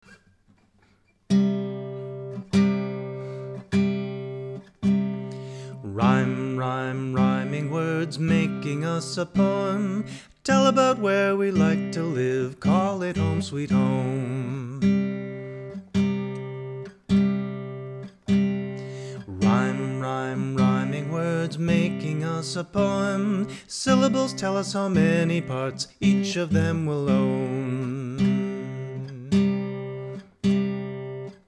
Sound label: read aloud poetry song